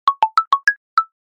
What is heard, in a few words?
Bongo